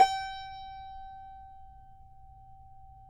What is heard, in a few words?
CUMBUS G, Guitars; Spectrasonics; Hans Zimmer Guitars VOL-2